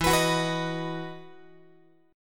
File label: E Major 7th Suspended 2nd, Guitar; EM7sus2 strummed